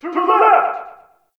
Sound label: Ambient Campsite Yells; Warframe; Tau